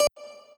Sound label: lead d